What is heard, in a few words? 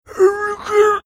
mimicking sound talking after spicy food going down wrong pipe